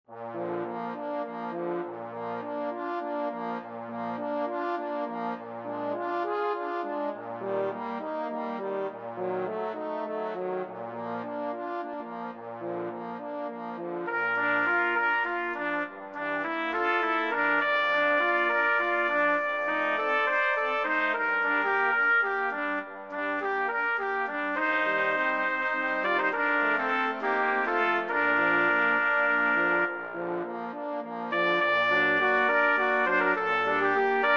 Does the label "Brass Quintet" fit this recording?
Yes